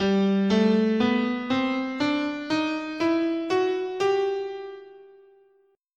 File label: G Bebop Major, scale